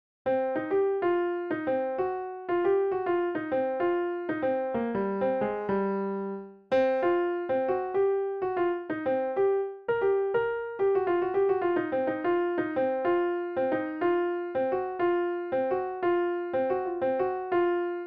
simple piano melody; Songwriting challenge

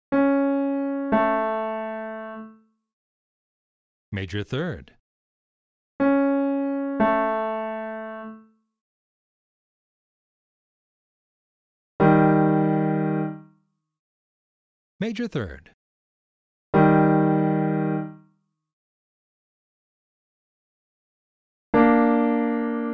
m3 M3 mixed; Training Exercise

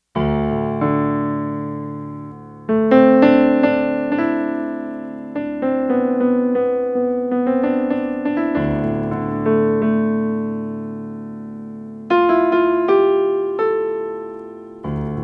D A D Dorian Piano Improv; D-A-D Drone: Dorian Piano Improv

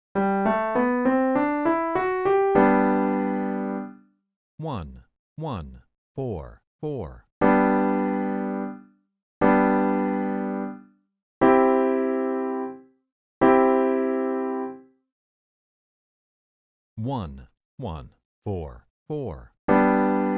I IV Easy; Chord Progression Recognition; Training Exercise

